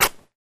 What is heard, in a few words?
Fabric Tear Buzz